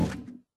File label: Rubber Tire Impact Soft